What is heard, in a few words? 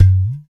Udu Pot Long, percussion; Roland BK-5